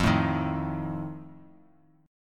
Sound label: F 7th F down; F7 strummed